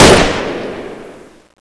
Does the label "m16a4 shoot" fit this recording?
Yes